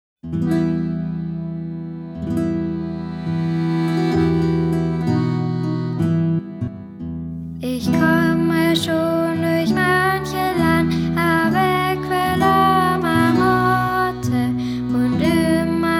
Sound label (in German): ICH KOMME SCHON DURCH MANCHE LAND - Liedeinspielung